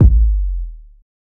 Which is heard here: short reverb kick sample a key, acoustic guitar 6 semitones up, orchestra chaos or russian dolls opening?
short reverb kick sample a key